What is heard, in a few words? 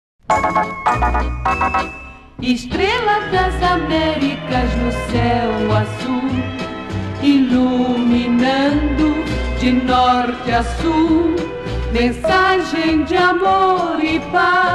jingle; varig